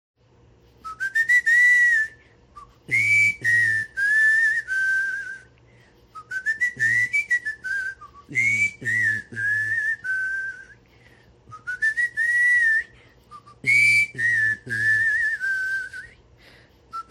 tommy whistles

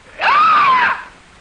scream

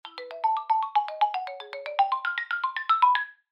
phone ring; mario

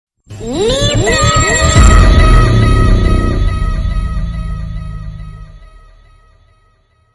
bombo impact

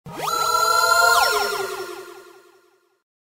card appears; rouxls

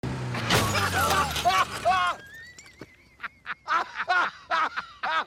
laughing

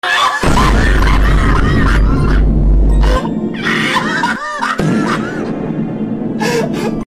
laugh ohhyeahhh

